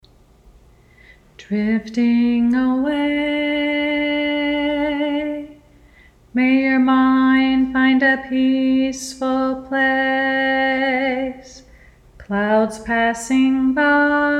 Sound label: Only Vocals LOW HARMONY ONLY